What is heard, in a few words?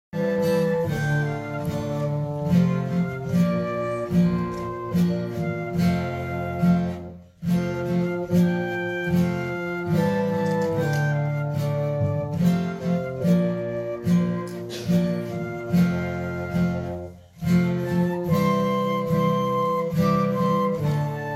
Prelude; Holy Trinity Musicians